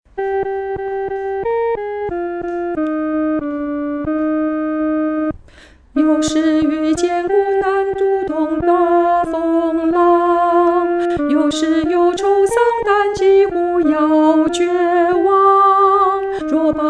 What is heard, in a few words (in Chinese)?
独唱 第二声